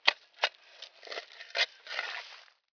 dig; Revista CD Expert 45; ZEUS

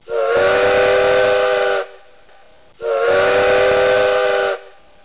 Train

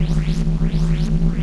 shield film; Net Power 1999 #12

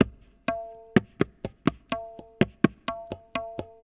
PERCLOOP ETHNO 125; eJay

